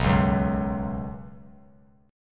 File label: B Suspended 2nd, chord; strummed; JGuitar